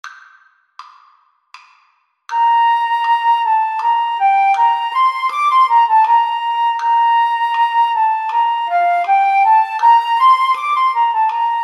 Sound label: flute